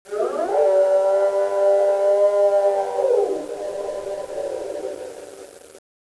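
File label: ambient, halloween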